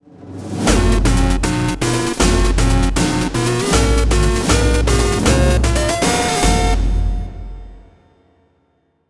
Jingle - Area Clear!; Bionic Commando Rearmed

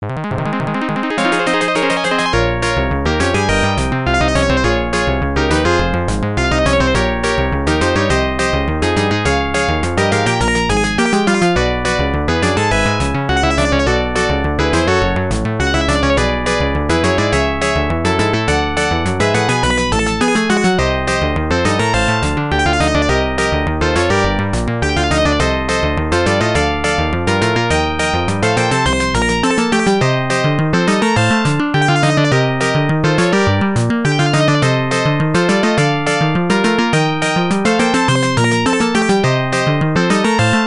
All Clear; Trojan; arcade

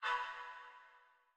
GUI Sharp positive; The 39 Steps

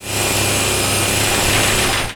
SawBlade

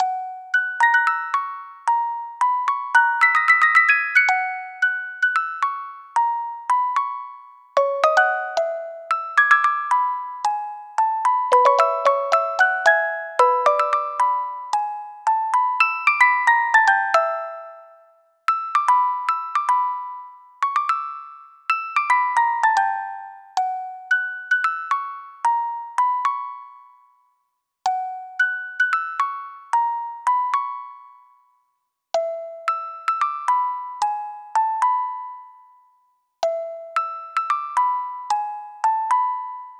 Funk (Music Box); animal crossing new leaf welcome aamiibo soundtrack

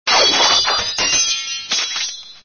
SFX sound (sword loop)

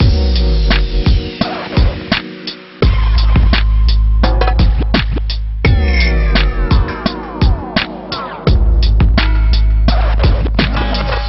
Lose; Bleach Mobile 3D

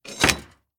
Pipe Stuck

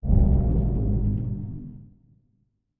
UI Click; Goddess of Trampling

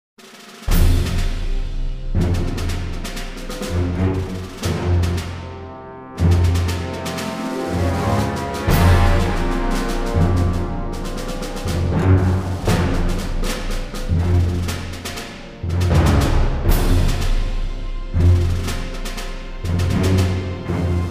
Tactical Ambience; Master of Orion

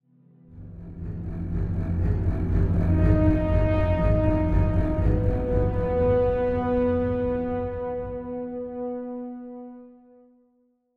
Stinger; Angry Birds Star Wars 2; Crimson Stinger Dark

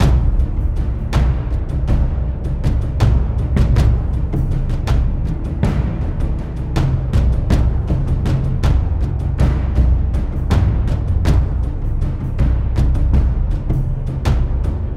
Loop Fight

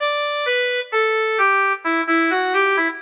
Sax; life is a game android

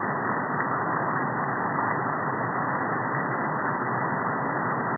Rain Loop Car

